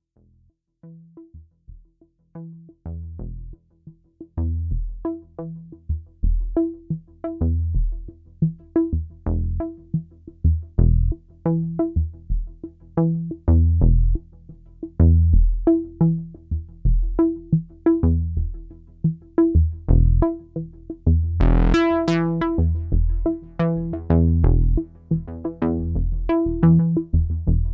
Dominion Internal SEQ

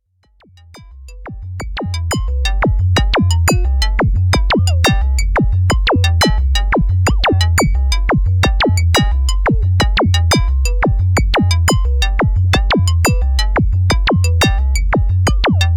Sonic Six ring mod, synthesizer

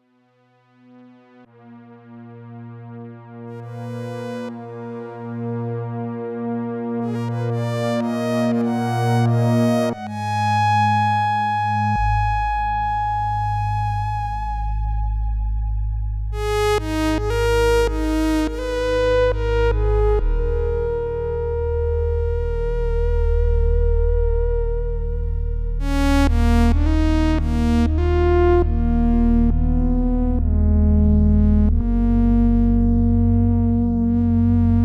A8 Soft Solo, synthesizer; GRP A4

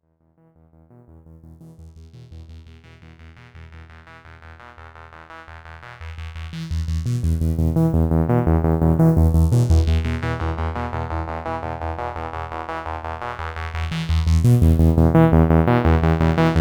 syrinx filter sequ

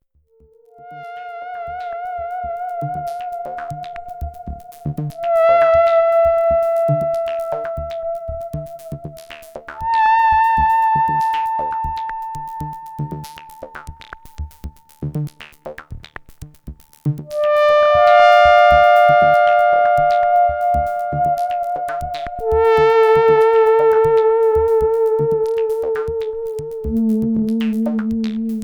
Sequential Prophet5 Mix

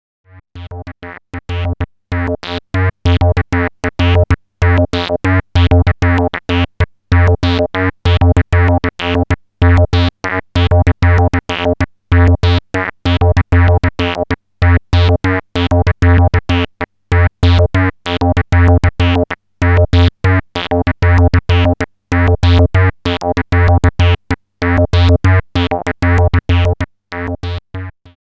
Seq D

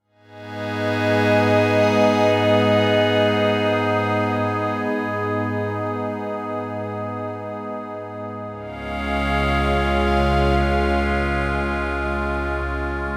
PPG Wave Pad

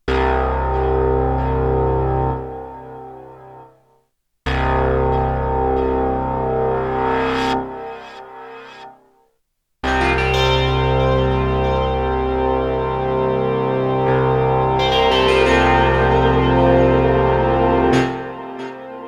Modal A8 EP Chord Improvised Heavily Modified

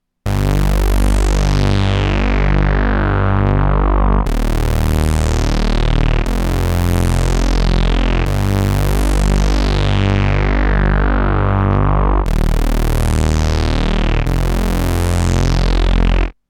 Volca Bass saw

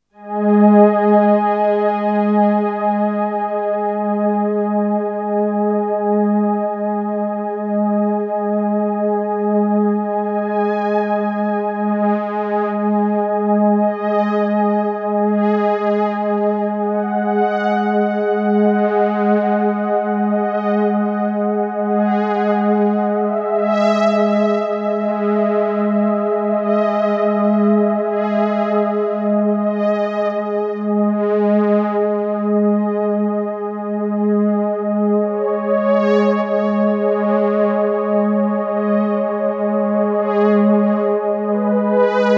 GeneralMusic S3 Polyphonic Aftertouch

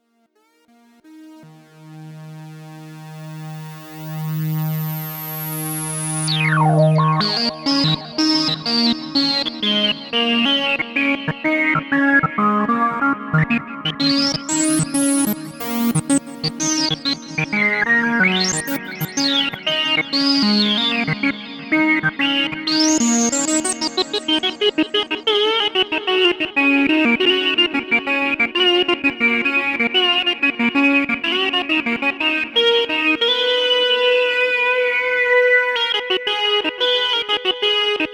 sixtrak filter Reso unisono

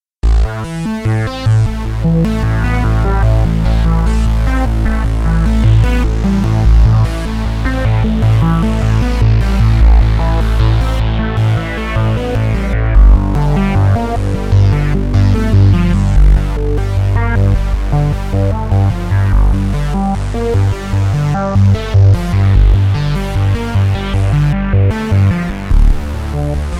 Waldorf Rocket Dual Sawtooth LFO to VCF

